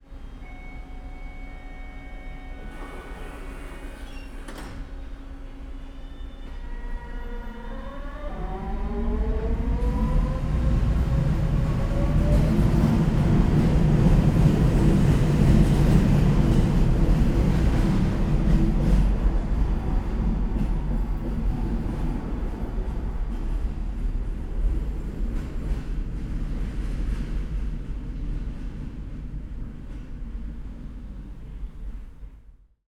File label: Train Rapid Transit Toronto ICTS Chime Door Close Away Shudder Loose Hasty; Airborne Sound; Vehicles Transit Trains